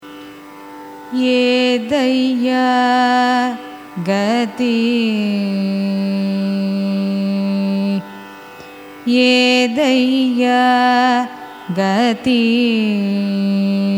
raga chala nattai carnatic; edhayya

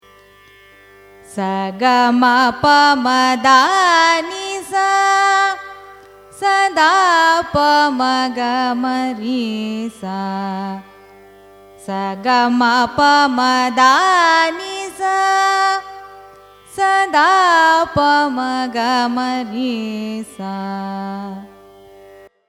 raga kannada arohanam avarohanam; carnatic music

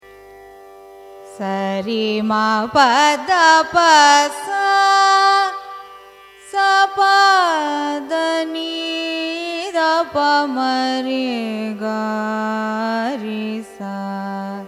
raga nayaki arohanam avarohanam; carnatic music

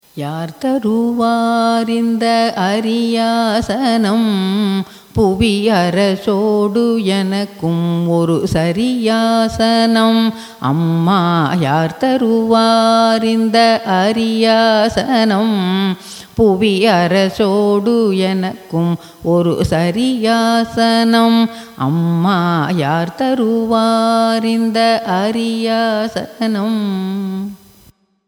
raga atana cine

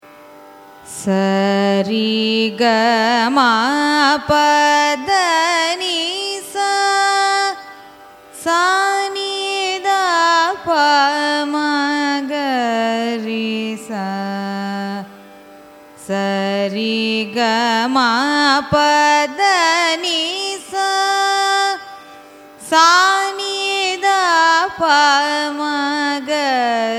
raga dharmavati arohanam avarohanam; carnatic music

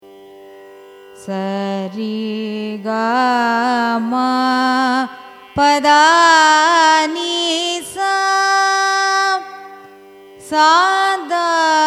raga darbari kanada arohanam avarohanam; carnatic music

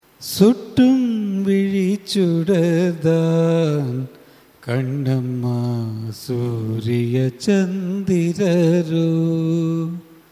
raga behag cine

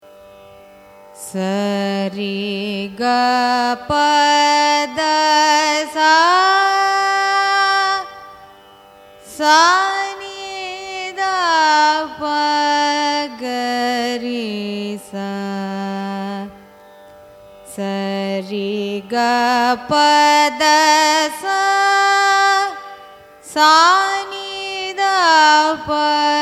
raga bowli arohanam avarohanam; carnatic music

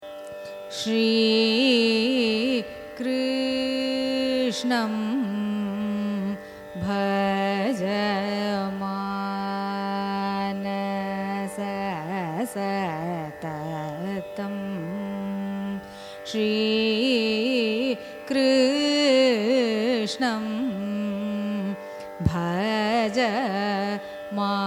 raga thodi carnatic; carnatic music

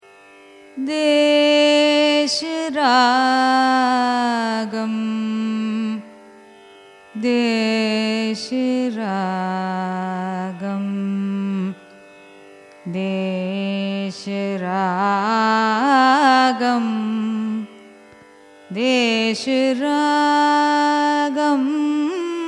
raga desh, carnatic music

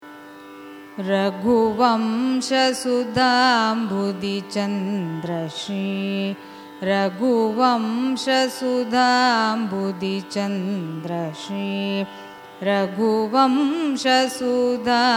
raga kadanakuthoohalam carnatic; raghuvamsa

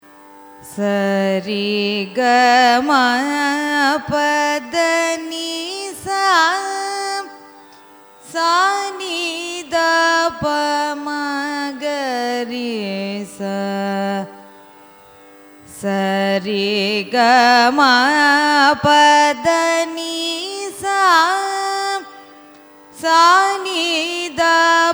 raga ramapriya arohanam avarohanam; carnatic music

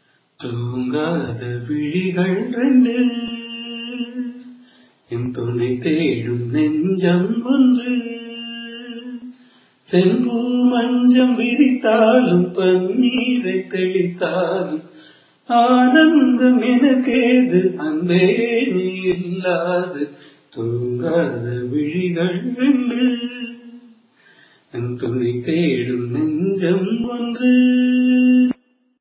raga amrithavarshini cine; carnatic music

